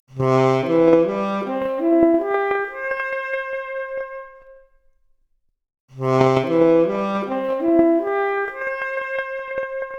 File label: Saxophone arp, mp Stereo output noised, 4bit